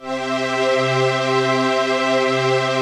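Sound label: chord pad, Pads; Best Service; XX Large - Pads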